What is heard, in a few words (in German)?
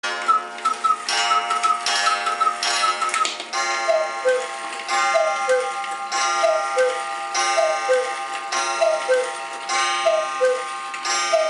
kuckucksuhr